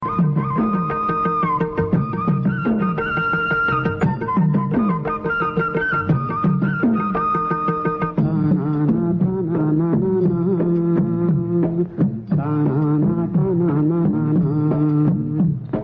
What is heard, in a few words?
flute ringtone; malgudi days